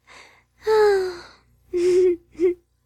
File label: Cuddle; Bedroom; Hilzartov; Fairy Size Doesn't Matter